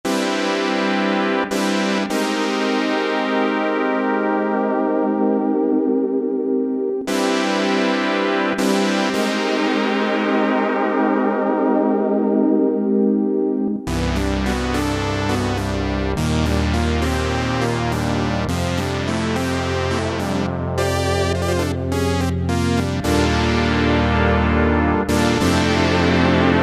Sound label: Poly Analog 5th; Kurzweil K2000 V3